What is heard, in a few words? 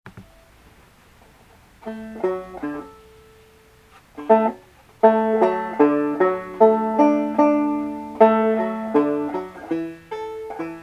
soldiers joy, banjo